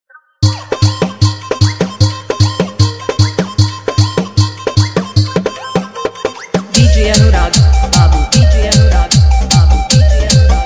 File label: Full Hard Bass Jhan Jhan Bass Mix